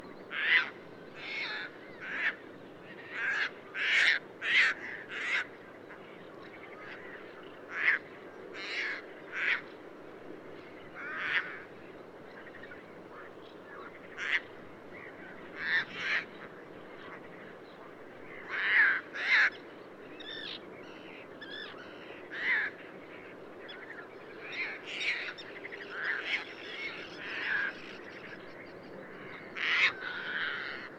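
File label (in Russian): chegrava golos, Caspian tern voice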